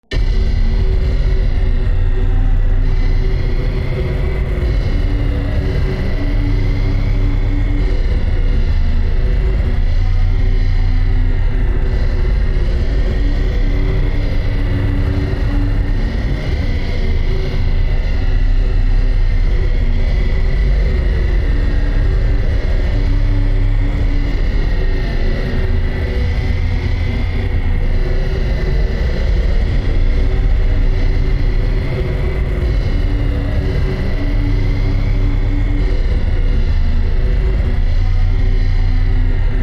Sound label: space rift voices